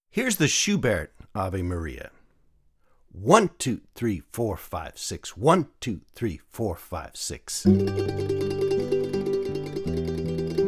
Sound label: Ave Maria Wedding Mandolin; Schubert